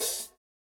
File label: Open Hat